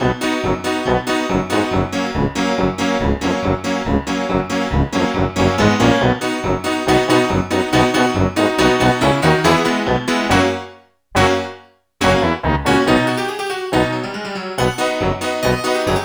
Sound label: Kids Music; Ages of Mages The Last Keeper